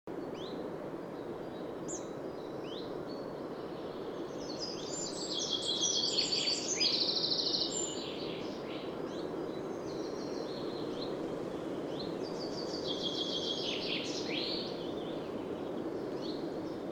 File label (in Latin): Fringilla coelebs; SETE